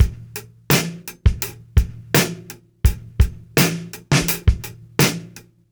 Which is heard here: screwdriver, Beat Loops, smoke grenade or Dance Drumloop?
Beat Loops